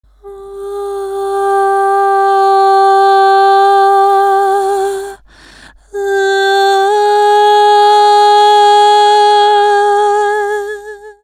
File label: Texture Vocals - Fm 80Bpm; Construction Kits; Ghosthack; SkyRealm; Kit 08 - Into The Light